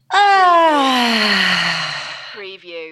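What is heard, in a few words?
Female Long Ahh Sigh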